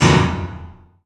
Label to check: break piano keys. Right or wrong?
Right